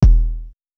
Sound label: BD kick